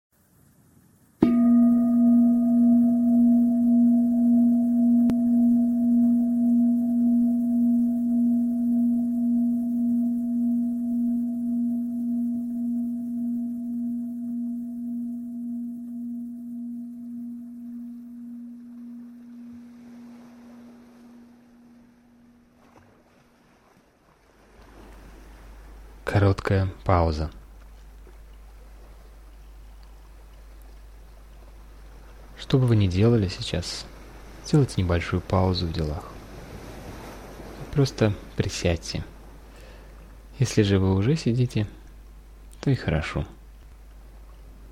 pause short ocean waves; Короткая пауза. Океанский прибой. 9 мин.

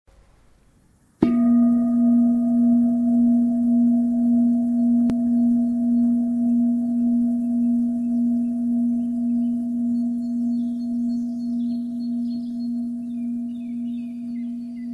just sitting bird song; Просто сидеть. Пение птиц. 24 мин.

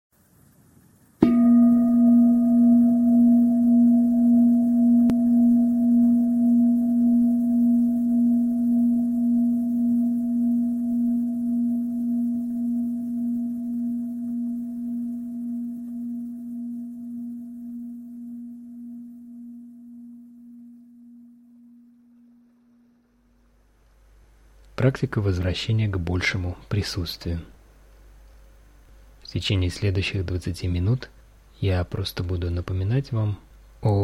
presence ocean waves; Практика присутствия в любом деле. Океанские волны. 24 мин.

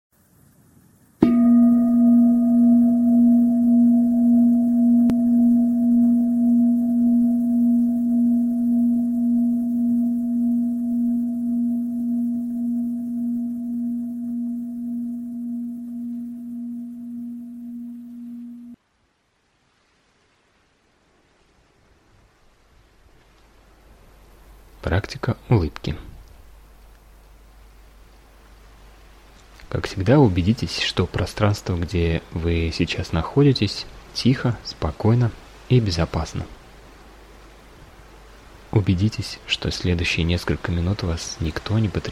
smile ocean waves; Практика улыбки. Океанский прибой. 14 мин.

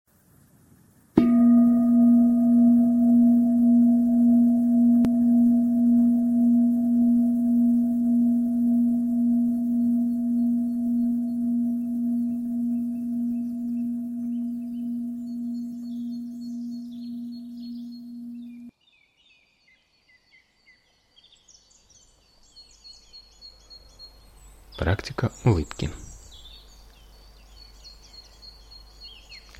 smile bird song; Практика улыбки. Пение птиц. 14 мин.

